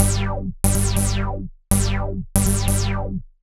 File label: ELECTRO BASS 140 A